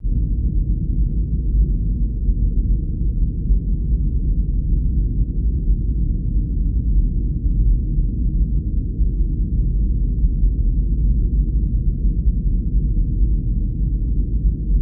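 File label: rumble, aircraft atmosphere; Total Control - Action Cutz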